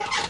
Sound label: squeaky chair